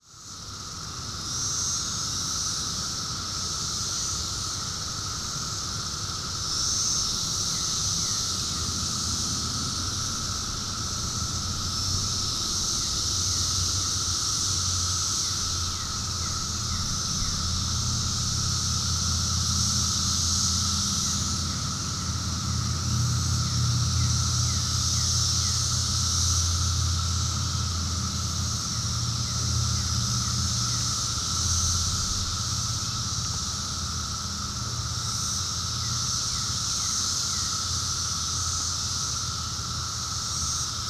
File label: Cicadas Brood V